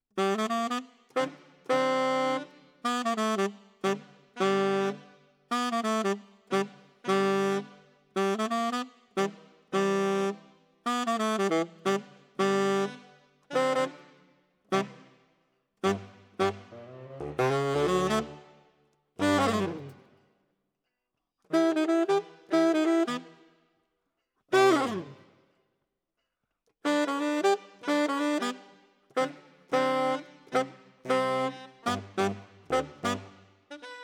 Tenor, RNR for piano

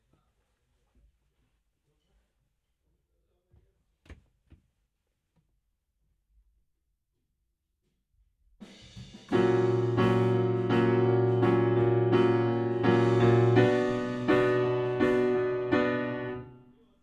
Piano Low

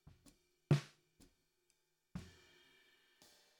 SNR Top

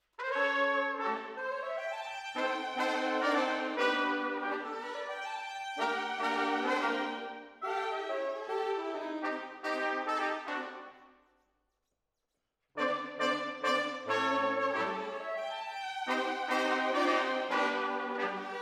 Horns Over

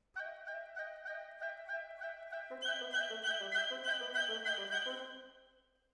Decca, Jazz for piano

